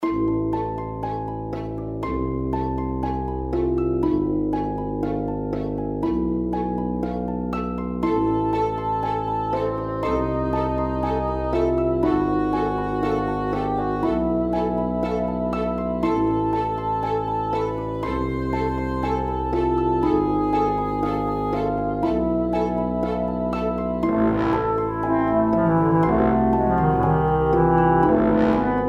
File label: Misterious Caverns (Quest); The Dwarf Run